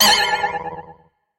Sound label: silly Meter Arrow; Poodletooth-iLand